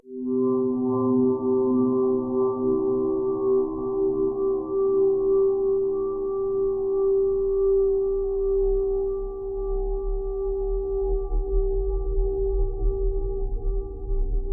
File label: spectral soundscape